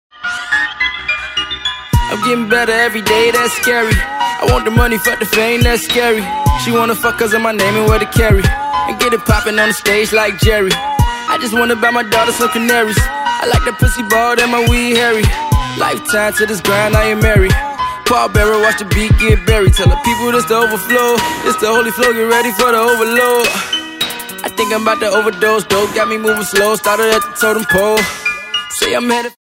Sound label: Scary